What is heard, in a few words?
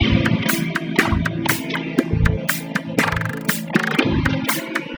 natural minor